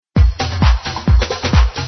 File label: synthesizer